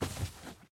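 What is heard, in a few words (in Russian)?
короткий спокойный мягкий звук выстрела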